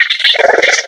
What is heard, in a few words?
box monster chitter